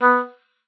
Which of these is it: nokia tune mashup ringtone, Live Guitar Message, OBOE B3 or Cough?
OBOE B3